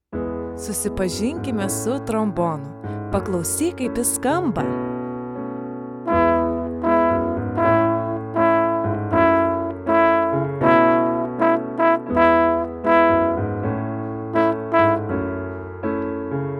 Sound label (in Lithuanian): Trombonas; Susipazink su instrumentu